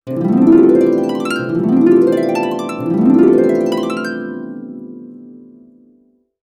harp gliss three times; orchestra-effects

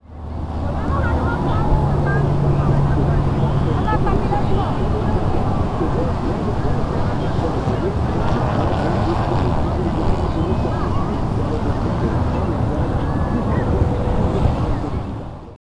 street

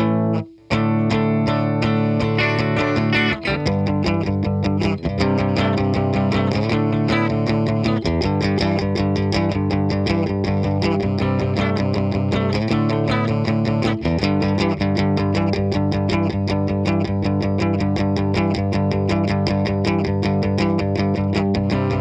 Rhythm Guitar

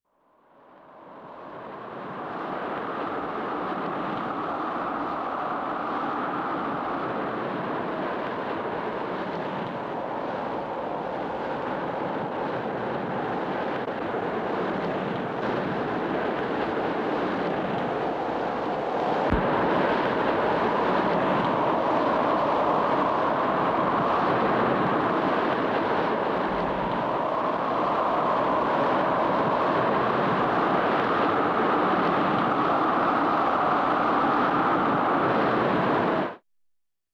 stormy

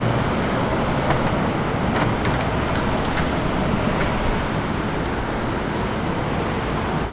street, ambience

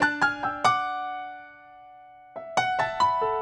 Classic Rock Piano, loops

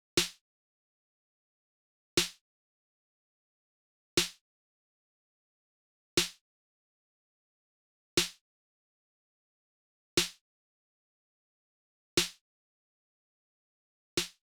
Snare

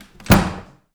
Door Slams; doorSounds

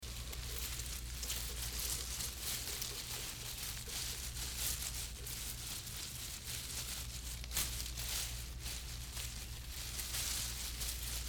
Rustling Through Brush; footsteps

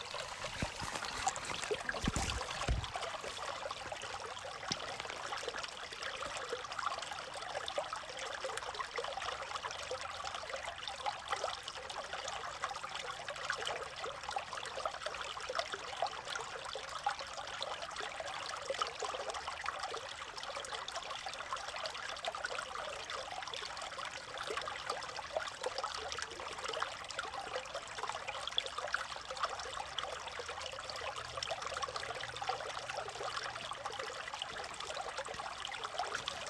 stream Gurgling; Fishlake; ambience

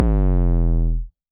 808 BD long, Roland-808 Drum Hits Bass Drum

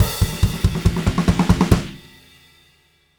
Drums Rock Ending, loops; Alex Sanfilippo

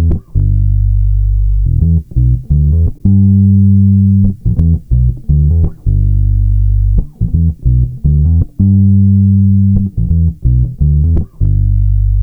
Bass

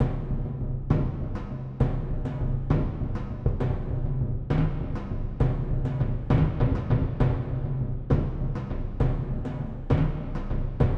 Drums Cinematic Energy Smaller 100

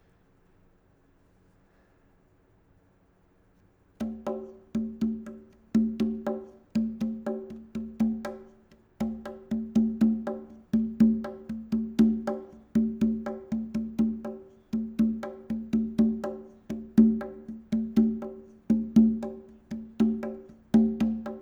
Congas Fan Noise

